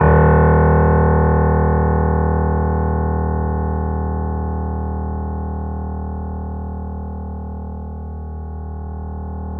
The Piano, D#; InVision Interactive - The Piano; BOESINGSOFT

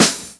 SD, Dance Drums

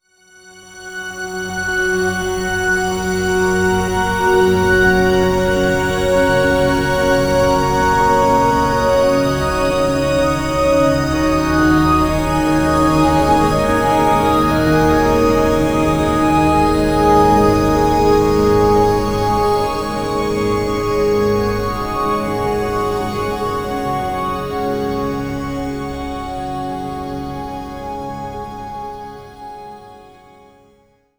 Long Hi Complex, Complex Wave; Sonic Foundry; Sony Creative Software; James Johnson Spektral Minimalism

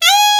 TEN SCP G#80, TENOR SAX; Northstar - New Gold VOL-1

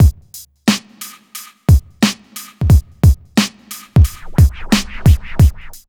beat, hip hop

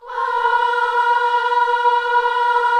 KIDS CHOIR, Gospel

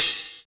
HH, Dance Mix

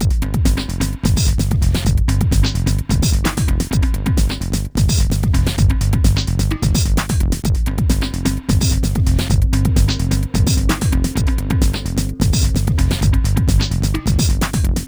Hardcore Breakbeat, 129 Cmin; Big Fish Audio; Hardcore Breakbeat Action

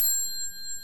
VIBES BELLS

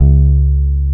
BASS C#2, Electric Basses; PlatinumPhattCD2; E-MU Producer Series Vol. 8 – Platinum Phatt

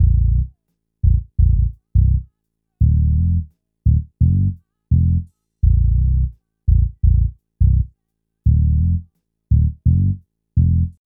bas 85 B; Never Doubt Me

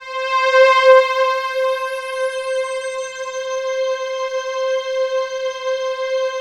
COSMIC, PADS; Masterbits; Vintage Voltage

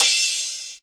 CHH, Dance Drums

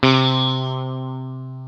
EL SITAR C2, Classic Guitars; E-MU Formula 4000 Series Vol. 3; Analog Odyssey

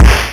SEQUENTL-TOM; Rubber Chicken Software Sample Bank; DRUMS + PERC

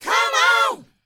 DANCE 120, Vocal; ILIO; Vocal Planet VOL-4; R&B & Dance

